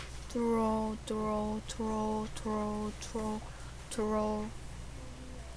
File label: throw